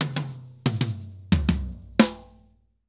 Drums Poly; Best Service; Festa Latina VOL-1; Drums & Percussion

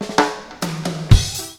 FILL SD, Ragga & Dub Reggae; USB Soundscan vol.27; FILLACOUS

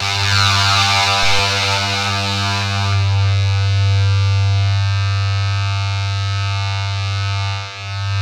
ZWEEP; Spectrasonic Distorted Reality 1

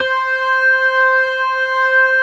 ORGAN, C3 PERC; Keith Emerson Signature Series

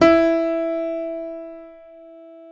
PNO FF; Synclavier Sampler Library

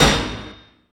TYRE, Impacts, Hits & Booms; Distored Reality

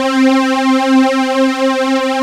WRM STRINGS, ANA SYN; AKAI S6000 CD-ROM - Volume 1; SAMPLE WAVE MIXING 2

